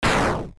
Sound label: Hit Powerful; openbound